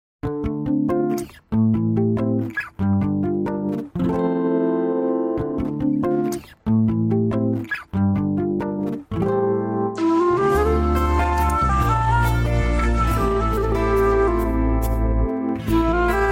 Malayalam Song Vocal Removed Karaoke

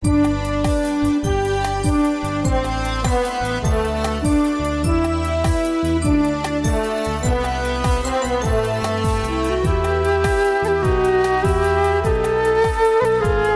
X'devotional Karaoke

